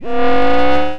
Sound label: alarm; zepsounds